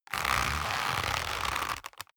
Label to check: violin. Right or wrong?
Wrong